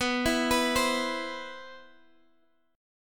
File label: C Major 7th, B, guitar chord